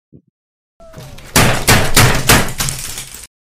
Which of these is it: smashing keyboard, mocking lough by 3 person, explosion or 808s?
smashing keyboard